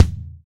TY Kick; Roland BK-5

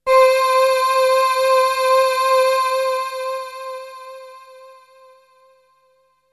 Melody oneshot; ZERO G - Datafile DISC 2

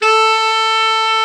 TENOR, Tenor Sax; Tenor Sax 1

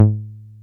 303 A2, TB 303; Hollywood Edge; Giorgio Moroder Rare Synthesizer Collection

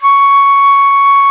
C#5 FLUTE; AKAI S-Series CD-ROM Sound Library VOL-7

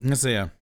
DELTA, BLUES

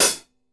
SHORT HIT, HI-HATS; Sampleheads; New York City Drumworks VOL-1; KD HI-HATS

